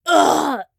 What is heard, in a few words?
flyer death